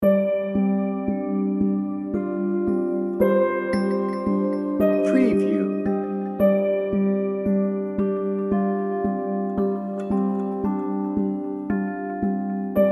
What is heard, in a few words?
distant storm